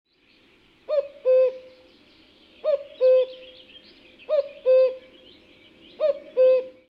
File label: Cuckoo; mahler 1; wandering apprentice